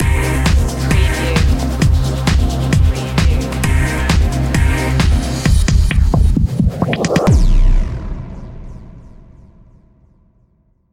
stinger

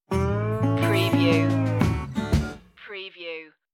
barbed wire blues stinger

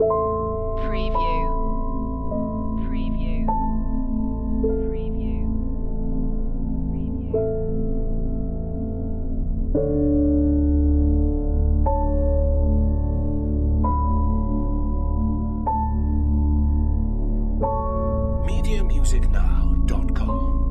a creepy feeling long looping

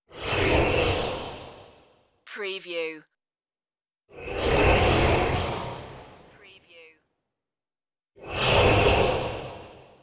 space disappearance whooshes

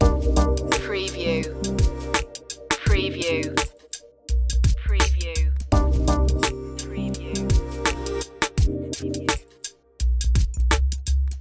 abstract vibes loop, chill

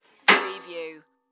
Folding Chair Close

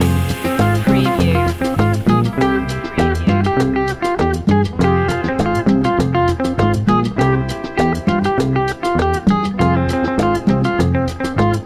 cumbia libre loop

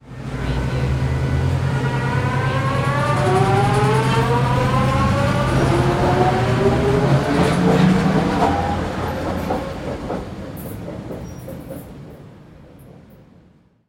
Elevated Train Away; Sound Effects Bible

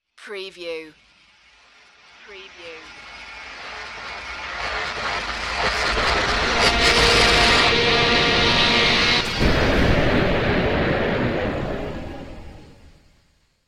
evil dark rising

